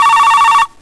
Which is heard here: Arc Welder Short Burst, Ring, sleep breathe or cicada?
Ring